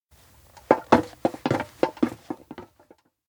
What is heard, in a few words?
steps indoor trailer house exit; spica; anemoi パッケージ版 1.1